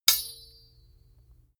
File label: sword hit sweetener